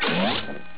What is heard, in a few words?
bounce